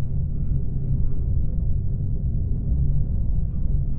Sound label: Noise Loop, sparse soundscape